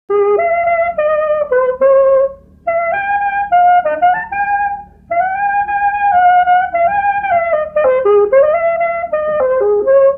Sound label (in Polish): Oberek, klarnet; Cmolas; nagr. F. Kotula, 1967